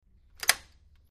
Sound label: Metal Deadbolt Turns; latch, metal